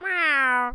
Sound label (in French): Chat